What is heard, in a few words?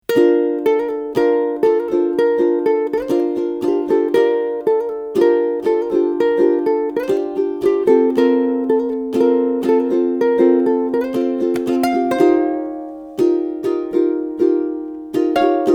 Solo Motif, ukulele